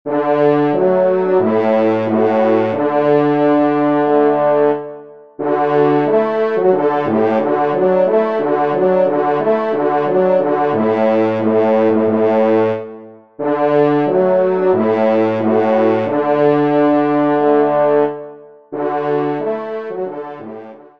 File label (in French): Retraite, trompe; Les Loisirs du Chasseur n°12; Pupitre 3° Cor; Henri Sénée